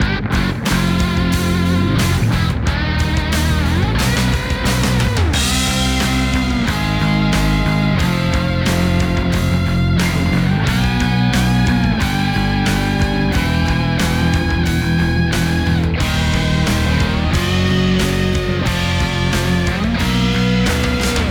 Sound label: Opponent Goal; Luigi